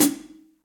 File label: Hihat Foot Ambient Loud Punch; Drum Hits; Standard Hits; Ableton; Factory Packs; Session Drums Studio